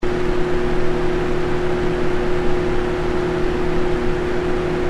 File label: Fleece High; K-Tek